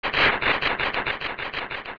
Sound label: Stalchild Disappear; zelda; OOT